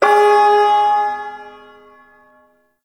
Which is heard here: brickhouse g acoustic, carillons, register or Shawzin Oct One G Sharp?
Shawzin Oct One G Sharp